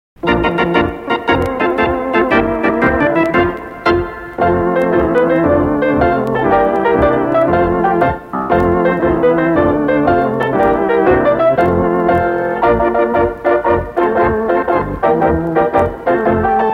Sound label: quadrille, instrumental; 5 part singing quadrille part 1